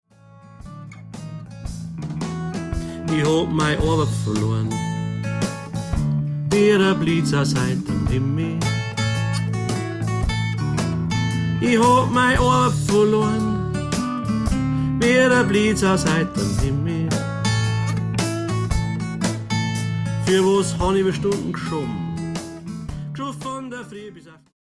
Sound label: Hartz IV Blues